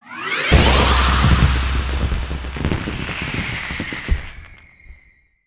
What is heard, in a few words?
Complete; aronwk collection; ndaudio